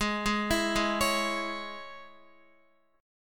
G sharp Suspended 4th Sharp 5th Guitar chord, down; arpeggiated; 4F,4A,5C,5G,5C,6E